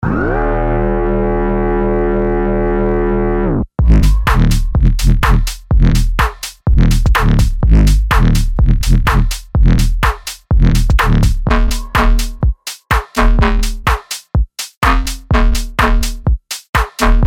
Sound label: Bass House